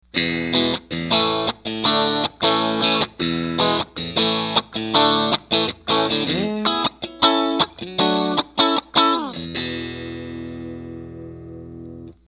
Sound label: cable